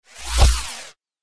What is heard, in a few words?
wipe